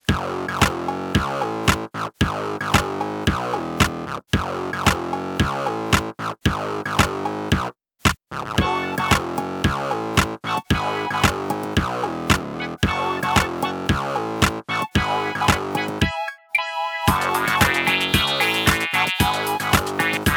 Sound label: Sneaky Synth, ringtones; Windows Mobile; Individual download-only (2009); Microsoft